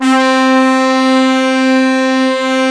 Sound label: MOOG; USB Soundscan vol.49; Analog Fantasies; AKAI